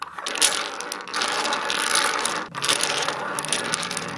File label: shuffle; Quentin C's Gameroom